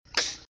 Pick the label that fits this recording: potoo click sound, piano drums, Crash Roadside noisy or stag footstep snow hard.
potoo click sound